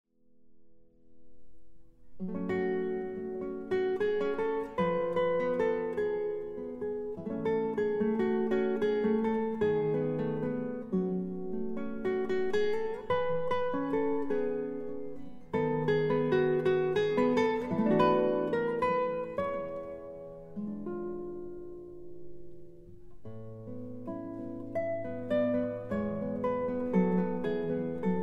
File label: Arr. for Guitar